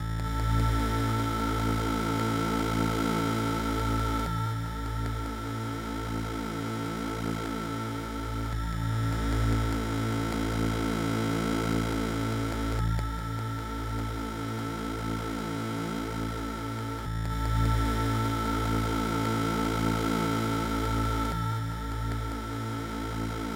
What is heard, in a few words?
Heartbeat; Heartbeat (Vents); metal gear music collection